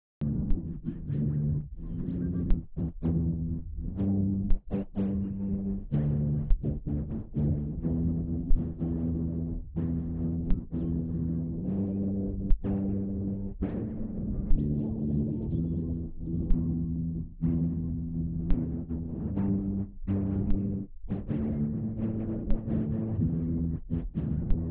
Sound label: gen bass